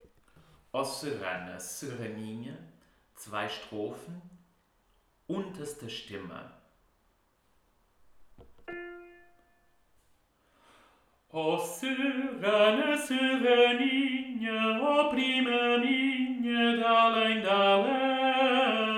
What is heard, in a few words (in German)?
O serrana unterste Stimme